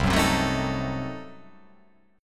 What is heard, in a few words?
C Diminished 7th C